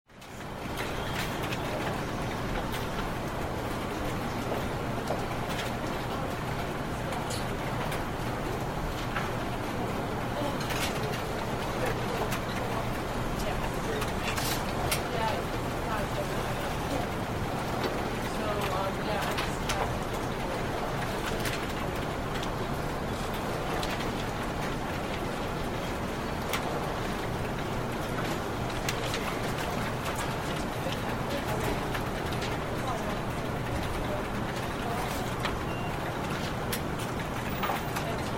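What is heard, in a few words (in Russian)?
общие звуки офиса. голоса работа печати.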